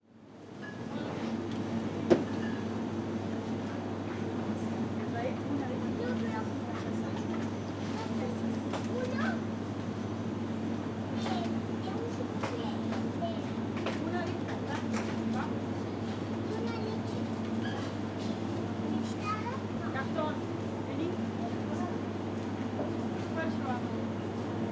AMB INT SUPERMERCADO heladeras voces español

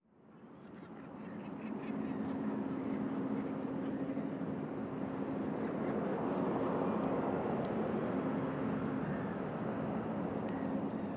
AMB EXT DIA Puerto de Montevideo gaviotas barco pasando

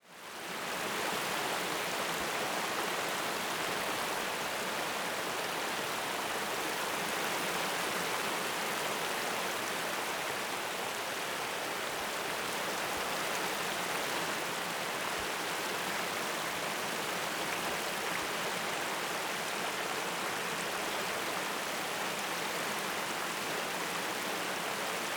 AMB EXT AMANECER ARROYO Valle Eden UY Generico

